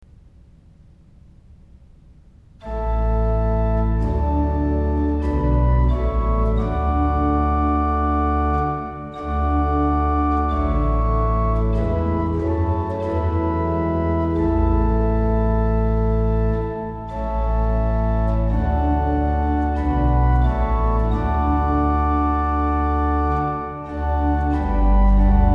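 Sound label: Ps81 Koraal, organ; Claude Goudimel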